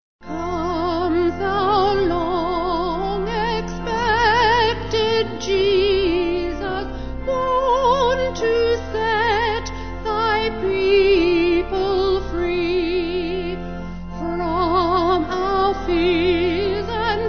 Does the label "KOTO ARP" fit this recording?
No